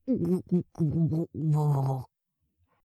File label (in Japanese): 泡ふき; 失神中